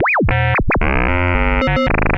ELCTRO INCOMING MESSAGE, communications

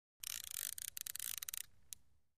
Fishing Line Out

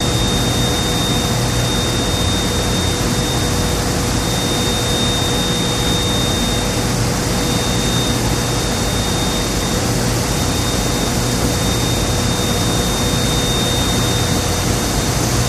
Petroleum Plant Hum

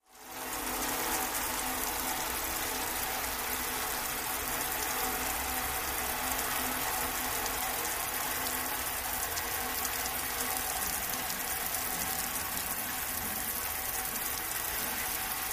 Rain Fall On Metal Stee

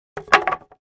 2x4 Plank Dropped On

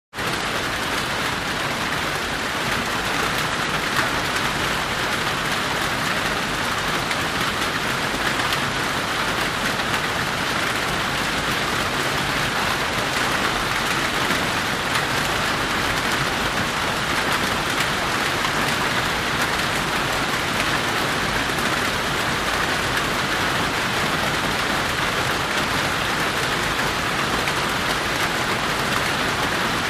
Very Heavy Tropical

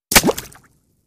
Bullet Impacts Water

